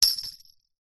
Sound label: musical sleigh bells place down on wood